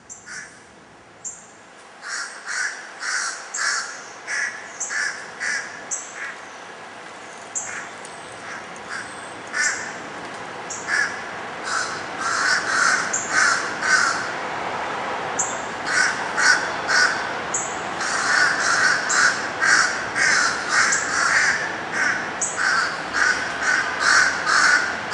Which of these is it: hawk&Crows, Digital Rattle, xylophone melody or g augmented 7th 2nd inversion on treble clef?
hawk&Crows